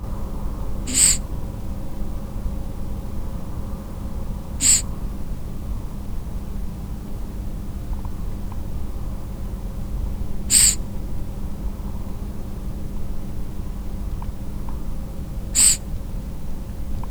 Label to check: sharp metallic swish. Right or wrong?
Wrong